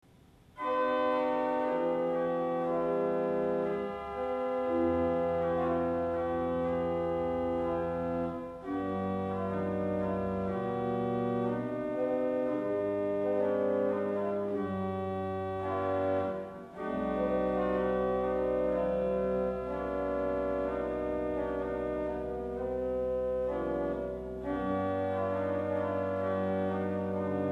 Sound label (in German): Geigprinc 8 OW, Orgelstimmen